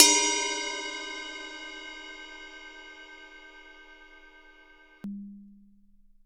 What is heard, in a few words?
drum ride sample f sharp key, ride cymbal drum sound tuned to the F# note